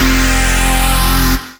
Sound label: Synth Bass; Vengeance Samples; VEE Synth Shots